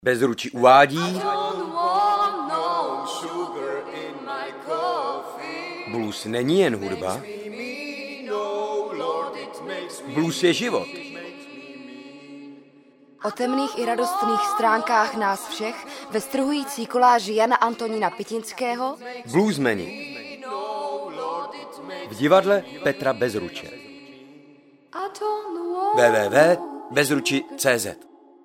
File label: Blues